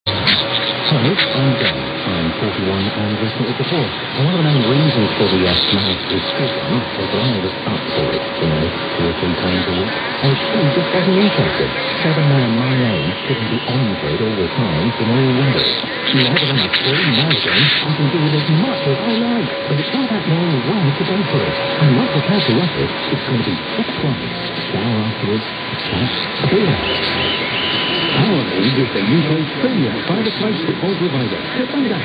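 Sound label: UK with Russia bells under, radio; Talksports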